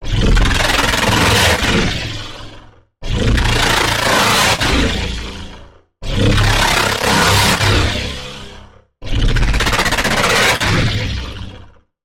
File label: Growl; Tyrannosaurus; Routed Sound; Vital Expansion - Chaos Bringer Vol.1